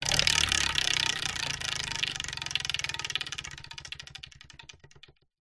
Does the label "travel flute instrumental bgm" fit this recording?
No